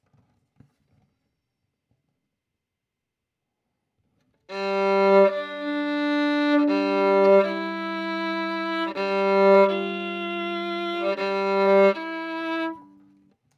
Violin

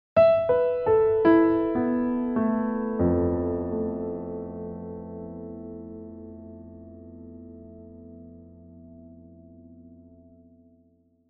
Evening News Theme Stinger; NEWSHOUR

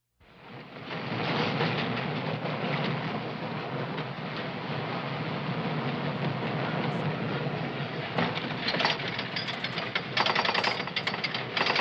Rollercoaster; Stereofect

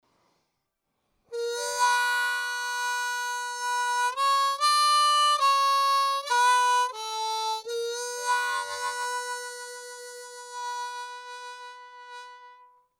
Harp Excerpt, Harmonica; Verdigris Music

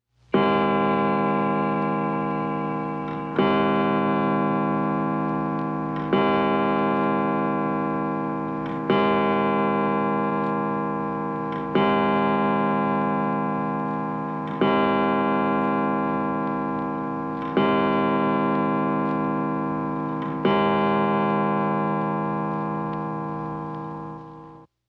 Grandfater Clock

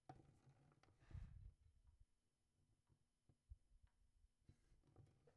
Violin; Verdigris Music

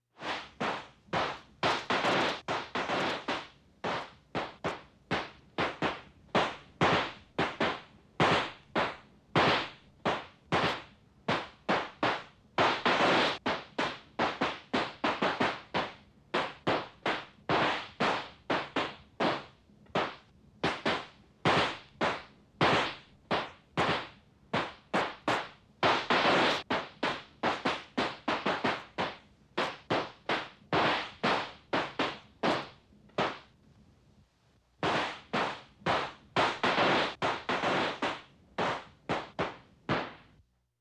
Firecrackers

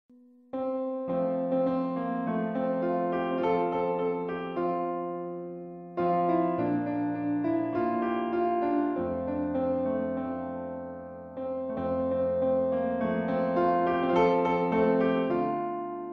Piano

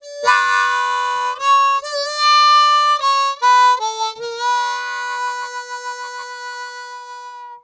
Harp Excerpt

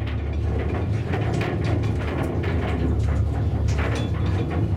Ore Cart

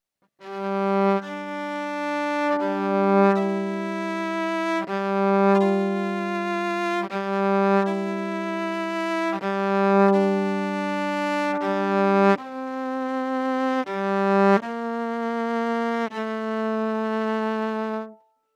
Violin; Verdigris Music

